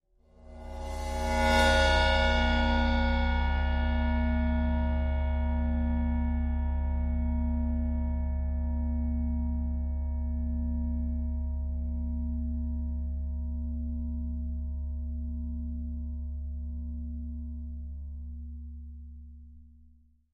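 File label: Old Ride Cymbol Bow close up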